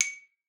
C#6, xylophone; Xsample VOL-4

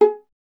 viola, solo instruments; Miroslav Vitous; Solo Instruments VOL-1

